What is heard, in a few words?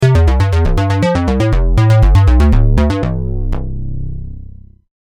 Videogame Music; audio logo, web background, video game music; Andrew Fleming